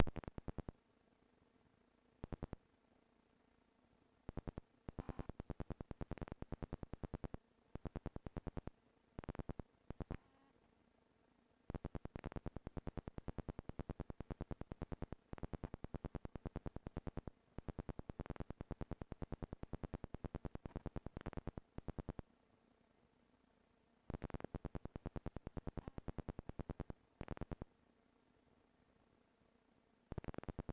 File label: basketball game